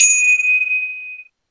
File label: ding